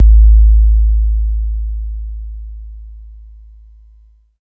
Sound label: 808 KIK; East West - Phat & Phunky CD2; 90's Sample CDs